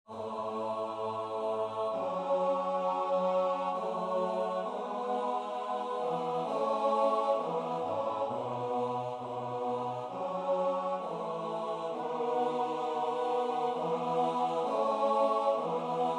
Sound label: hayr mer CHOIR